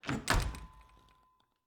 Cabin, front door, split door, oak, large, iron fittings, close, hyper; Eiravaein Works; Vaeyan IV